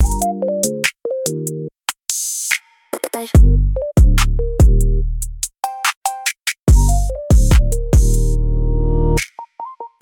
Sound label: loop; bgm; opening